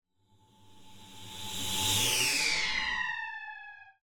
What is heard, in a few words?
craft med, cinematic swoosh; 3maze